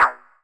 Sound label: Stabs, Elektron MachineDrum SPS1 MKII